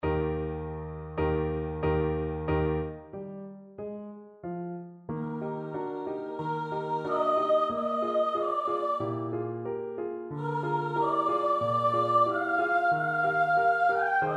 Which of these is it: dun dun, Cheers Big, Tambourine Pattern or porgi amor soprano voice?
porgi amor soprano voice